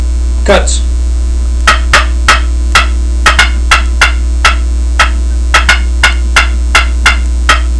slate cutts, turkey; Southland

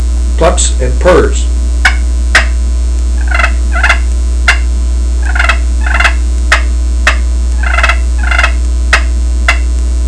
southland aluminum cutts purrs, turkey

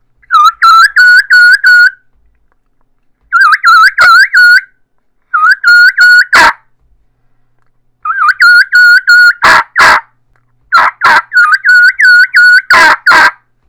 keekees keekee runs, turkey; batwing III; Southland Sounds

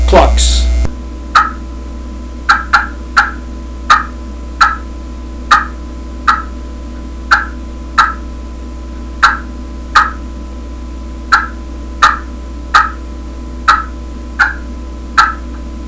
slate clucks

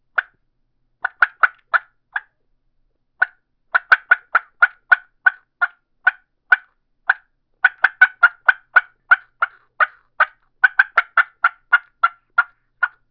mouth call cutts, turkey; dixie

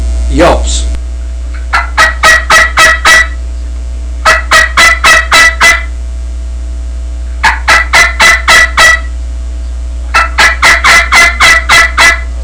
longspur inverted v yelps, turkey; Southland Sounds

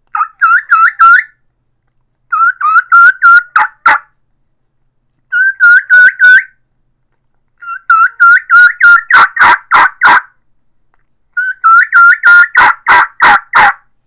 mouth call keekee runs, turkey; eastern supreme; Southland Sounds

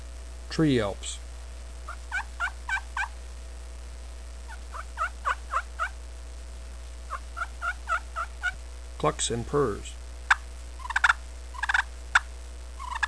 glass all, turkey hunting

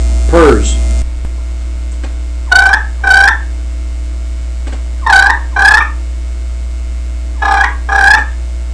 hunters special purrs, turkey; Southland Sounds

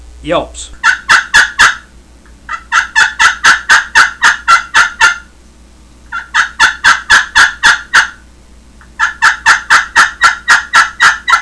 alum yelps, turkey; Woods Wise

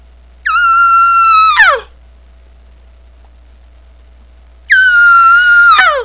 long yelp

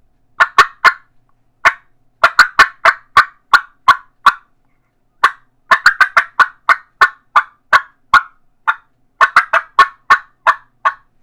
smackdown cutts, turkey; Southland

